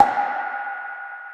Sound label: big room steel snare drum sound g sharp key